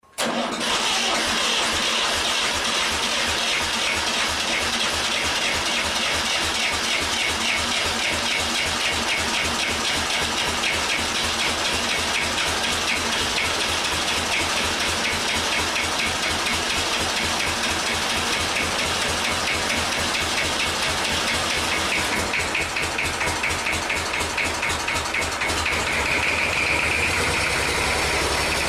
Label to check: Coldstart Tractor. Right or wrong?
Right